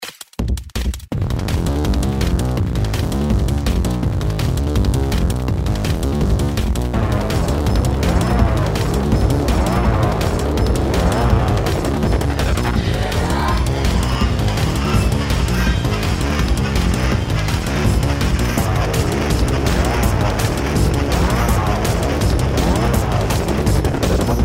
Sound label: Ambient / soundscape; Time running out theme